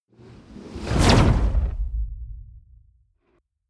whoosh fire zap flutter

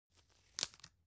tissue open soft

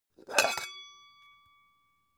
pan open hard

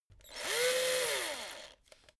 servo move medium high

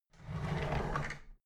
drawer big clatter open regular

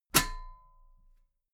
metal ladder step regular clank

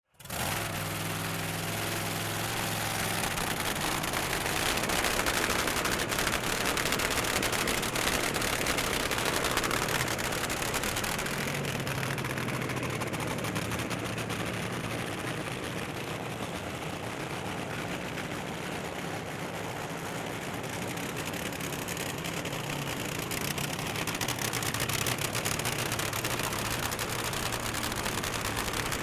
dragster full throttle

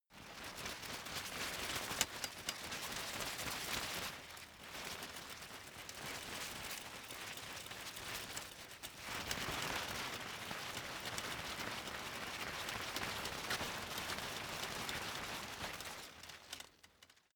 umbrella big shake rustle soft

